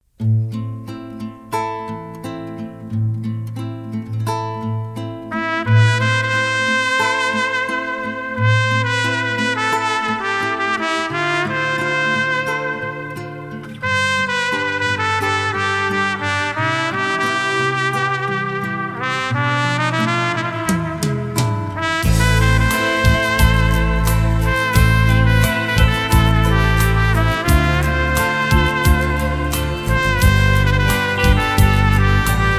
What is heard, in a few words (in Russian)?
Мелодии трубы